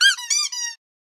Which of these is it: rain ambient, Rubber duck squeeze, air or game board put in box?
Rubber duck squeeze